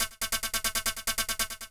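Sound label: HIHAT ELECTRO 140, Techno Drum; Techno2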